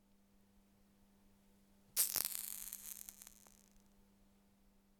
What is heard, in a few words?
Extinguishing Of Candle